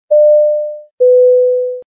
Airplane chime

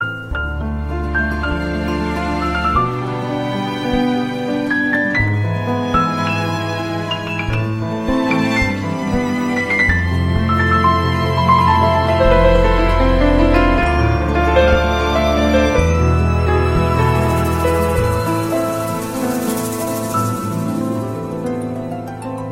romantic tone, ringtone

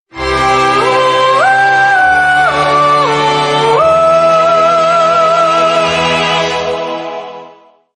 nice message tone; ringtones; sms

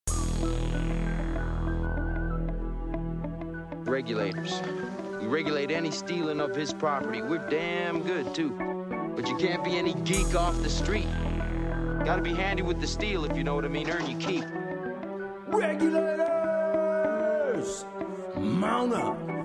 rap

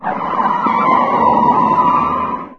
sms disc brake

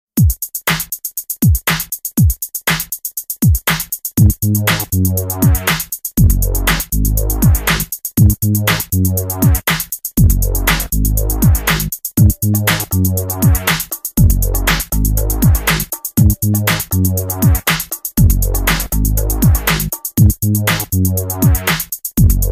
Hot Rod

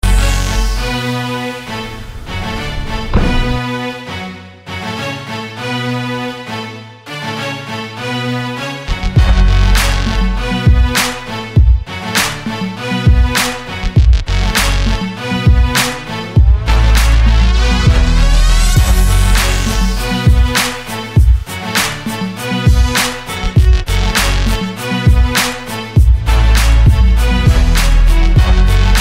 smooth guitar music

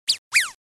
zing, sms; ringtones

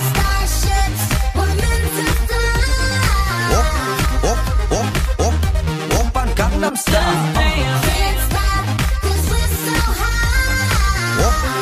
Pop Mashup

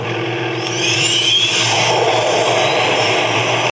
Blower ON

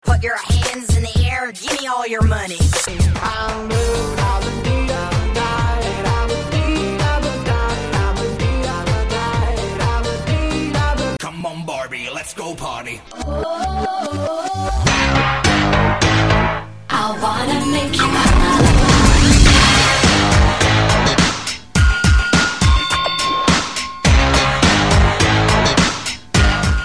mix 90s

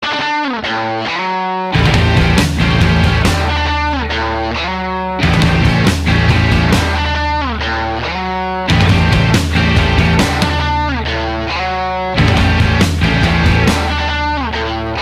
guitar intro; LCL

